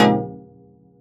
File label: Stab Chiffy BbSus7